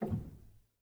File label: rel, Grand Piano; bitKlavier Grand; Piano Bar